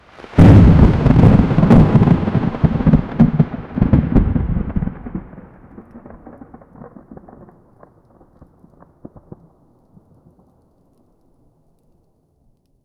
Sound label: sharp crackling lightning, disaster